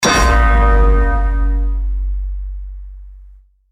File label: time bell ring